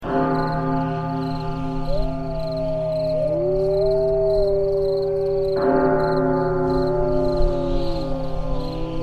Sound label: creepy cemetery sound effect, mourning bell